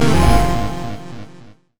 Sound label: crescendo; sona; pax sona